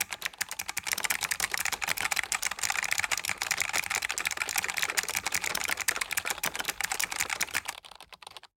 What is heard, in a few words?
Typing; Caffeind